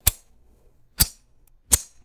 metallic zippo lighter flint strikes; fire

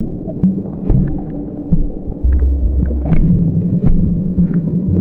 deep muffled underwater concrete thuds

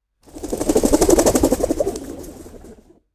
flock of pigeons taking flight; wings

